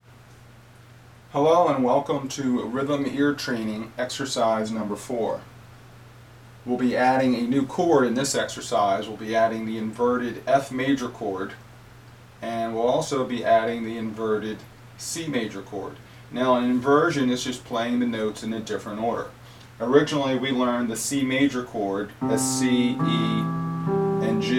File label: Rhythm Ear Training Exercise